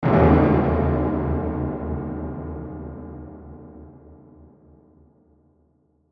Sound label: Hit Heavy Reverb Delay; Blastwave